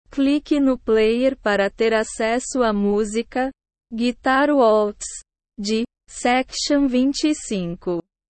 guitar waltz

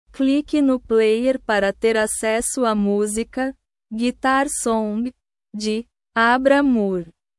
guitar song

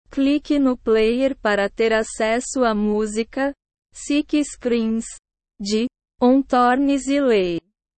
sick screams

